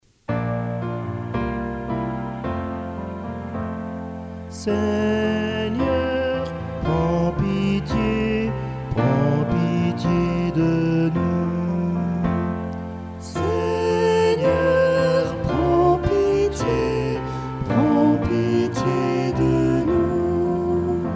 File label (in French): Kyrie, chants